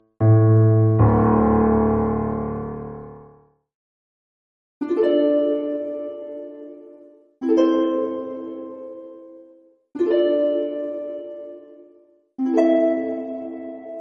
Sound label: music room instruments; lara croft tomb raider anniversary ost; croft manor